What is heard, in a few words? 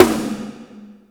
freaker, snares real